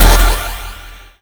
Points Award

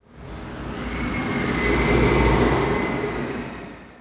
amb coaster dist; Tony Hawk's Project 8; XBOX

